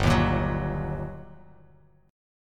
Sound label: G Minor Major 7th Sharp 5th, down, Bass Standard; GmM7#5 strummed; JGuitar